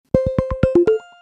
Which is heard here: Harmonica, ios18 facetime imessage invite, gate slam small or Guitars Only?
ios18 facetime imessage invite